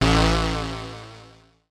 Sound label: KEY SHOT TEKNO 140 A; Techno2; Singleshots